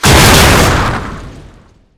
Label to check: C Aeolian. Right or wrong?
Wrong